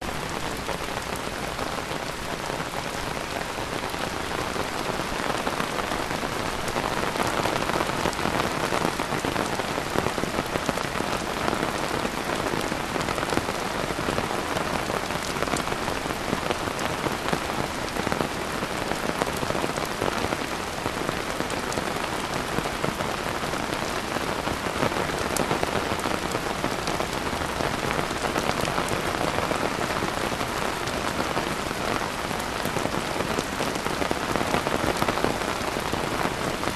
rain heavy on umbrella and concrete with thunders kuala lumpur malaysia; lukas tvrdon